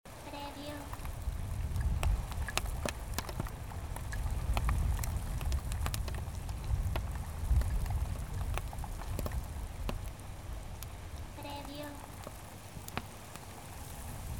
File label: Hail Rain Thunder